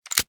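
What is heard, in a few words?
revolver magazine in; Weapons Guns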